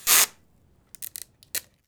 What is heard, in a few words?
TAPE, STATIONERY; AKAI S-Series CD-ROM Sound Library VOL-6